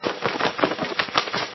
loop, bat; Eaglercraft; eaglercraft-1.5

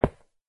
stone, dig; ayuncraft-1.5

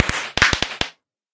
twinkle, fireworks; ayuncraft-1.5

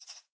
idle, rabbit; minecraft; mob

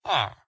idle, villager; minecraft; mob

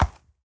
soft, horse; minecraft

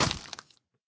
step, zombie; minecraft; mob

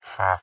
haggle, villager; minecraft; mob

